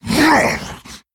Male Monkey Attack

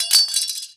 Underlay Death

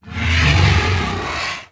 Hippogryph Vox Underlay

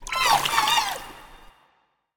Water Droplet Death

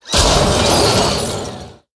Bumper Car Death

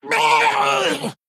Male Murloc Death

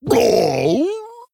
Male Orc Death

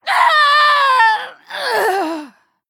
Blood Elf Emote Death

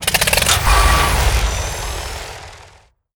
Piloted Sky Golem Enter Play

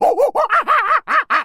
MONKEY ENTER PLAY

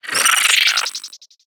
Attack; Clutchmother Zavas

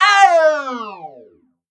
Male Mechagnome Death

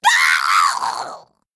Gnome Emote Death

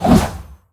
Epic Mace Underlay Attack

